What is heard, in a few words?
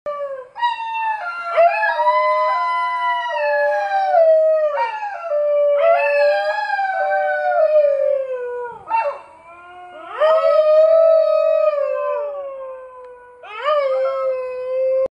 Dingo; دینگو